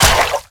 Step Water, Infested; Warframe; Zealoid Prelate

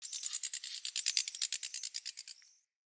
Prairie Day Close Cricket

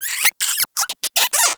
Rodent Lure Call; Warframe; Hunting Critters; Characters

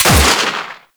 Revolver Pistol Shot

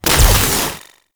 Gun Shot; Warframe; Weapons; Thano Tech Arch Gun

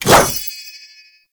Sword Swipe; Warframe; Duviri; Dax; Weapons

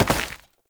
Step Dirt, Foley; Warframe; Oraxia

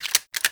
Grenade Launcher Reload, Weapons; Warframe; Corpus

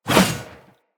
Sphere Sword Whoosh; Weapons; Swords; Sphere Sword And Board

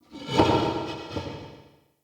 Stone Movement; Ambience; Crypt; Champion Crypt

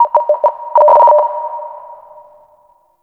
Interior Orbital Station Telemetry Mid; Warframe; Tau

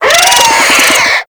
Infested Biped Death; Warframe

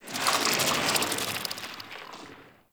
Infested Tendril Tree Creaks; Ambience; Props; Warframe; Infested Eris

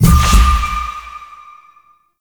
Minigame Ring Success; Birth Minigame; Warframe; Jade Shadows

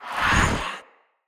Staff Caster Cast Long; Weapons; Player; Staves; Wazzard Staff Caster

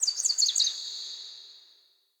Sparrow Song Dream; Animals; Vocalizations

